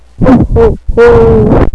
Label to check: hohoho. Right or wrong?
Right